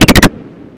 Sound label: Glitch